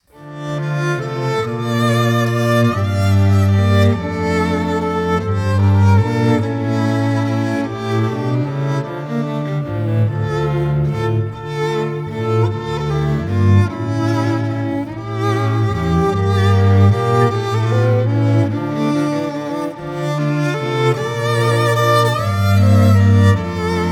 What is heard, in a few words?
Tum Balalaika, Strings